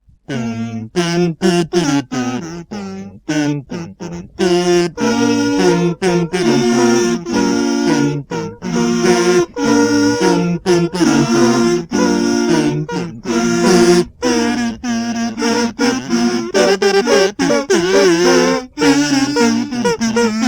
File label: Kazoo Cover; Pony Music Archive